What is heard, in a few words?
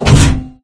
turret stop